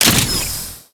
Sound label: Arch Rocket Crossbow Reload; Warframe; Archwing